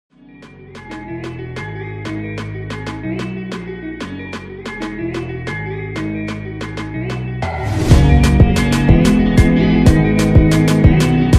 afrobeat instrumental

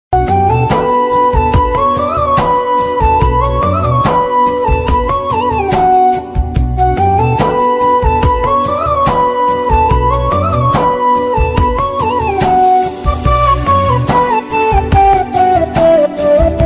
ringtone bgm ringtone

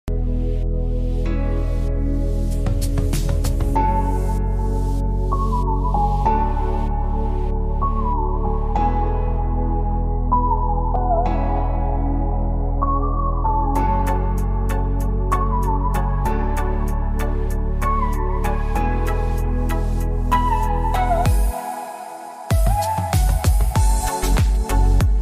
love bgm ringtone tamil flute

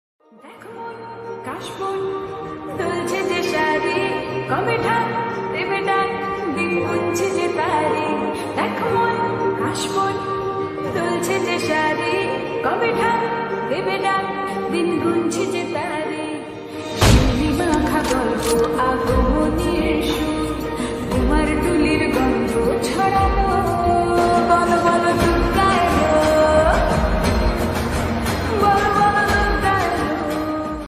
slow reverb song, durga puja

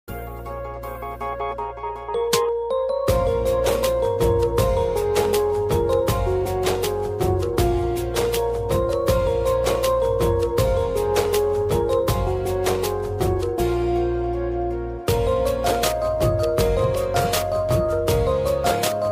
instrumental ringtone instrumental ringtone

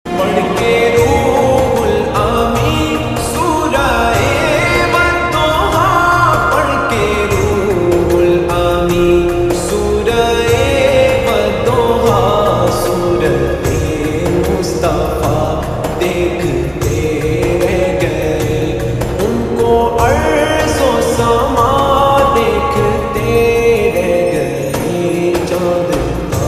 lofi naat ringtone islamic ringtone

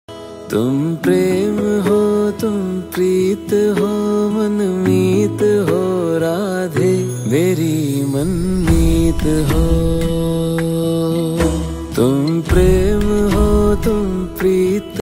Krishna instrumental music ringtones; shri krishna instrumental music; mahabharat shri krishna

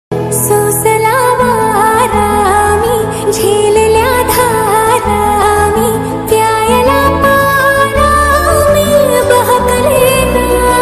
song lofi sound love

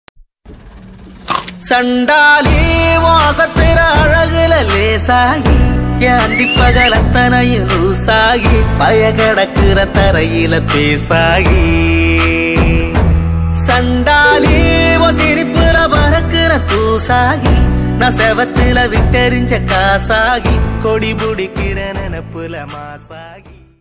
song bgm ringtone

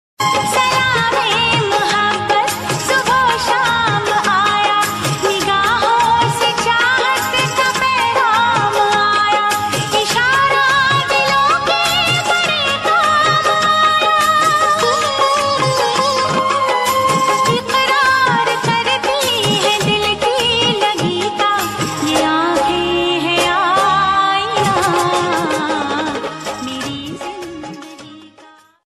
90s song ringtone old song ringtone

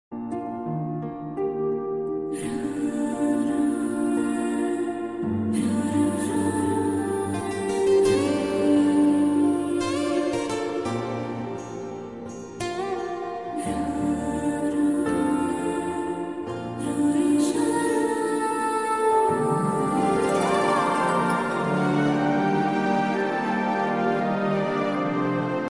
ringtone bgm sad ringtone bgm; makhi

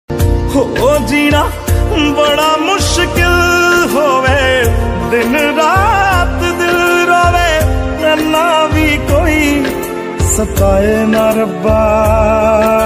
love sad song ringtone

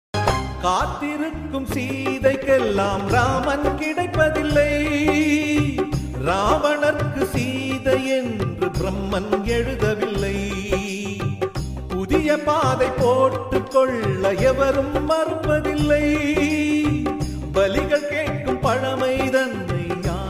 tamil song; aval varuvala movie

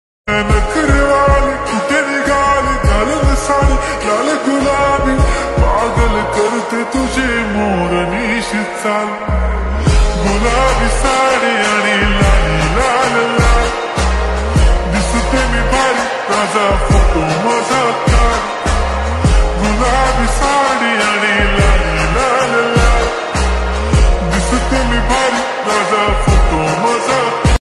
ringtone song slowed x reverb

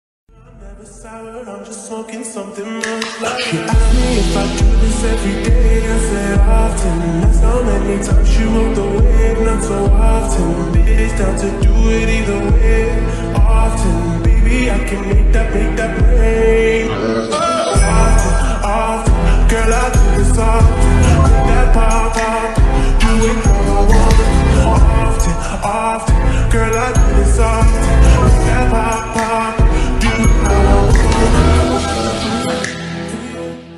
slowed remix ringtone

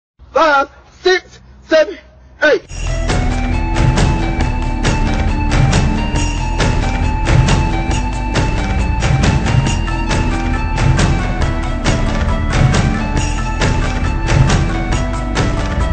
bgm; tamil movie; dhanush

